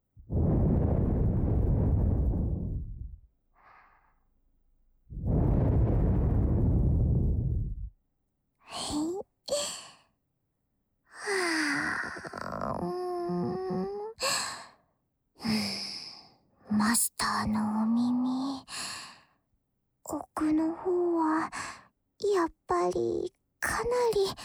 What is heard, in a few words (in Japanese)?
屋来杉耳かきの右耳耳かき。仕上げの梵天には屋久杉精油をなじませて; ウィスパートーク; 蓄音レヱル とらこ; CV.日高里菜